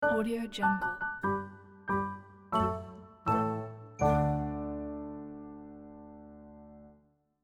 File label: cute achievement piano flourish logo